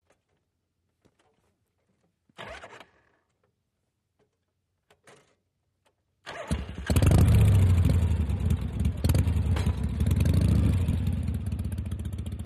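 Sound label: Motorcycle Harley False Start; harley-davidson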